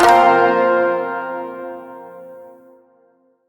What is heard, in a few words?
Shawzin Blues Chord C; Chords; Warframe